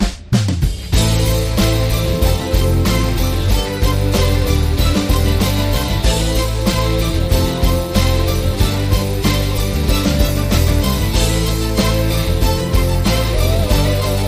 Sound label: Pop Rock Straight In